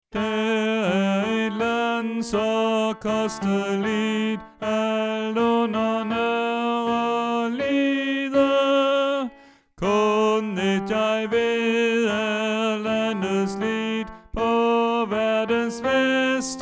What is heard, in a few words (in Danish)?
Tenor